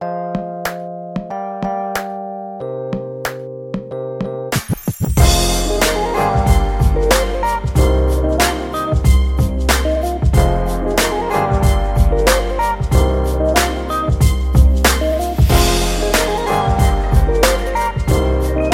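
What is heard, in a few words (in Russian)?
muzykalnaya otbivka, musical jingle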